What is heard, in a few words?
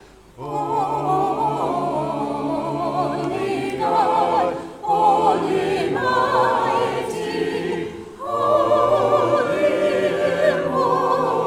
vocals choir romanian trisagion